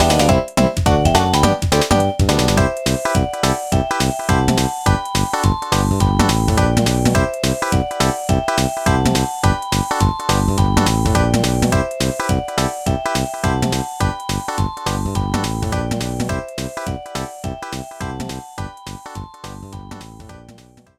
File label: Player Win; Dig Dug Digging Strike; Nintendo DS